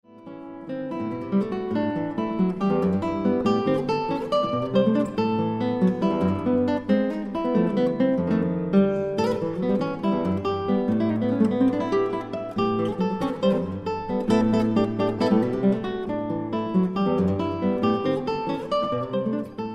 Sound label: chinese, guitar